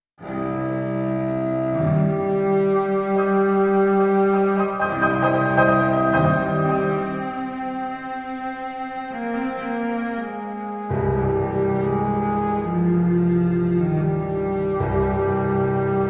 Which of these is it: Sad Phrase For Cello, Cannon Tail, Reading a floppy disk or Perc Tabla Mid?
Sad Phrase For Cello